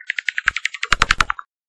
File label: bat call inside cave